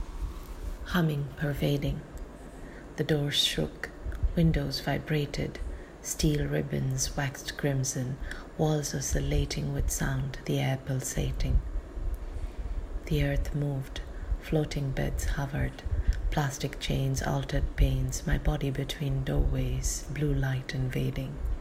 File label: humming pervading